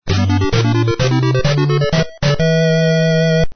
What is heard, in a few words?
win; nekketu; svencoop